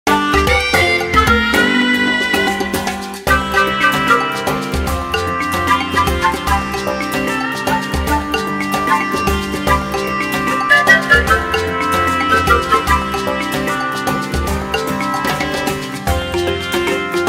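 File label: Desert theme; SMM2; SMB3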